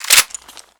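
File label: weapon foley mp5k reload chamber; Call of Duty Modern Warfare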